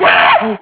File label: chimp; torchlight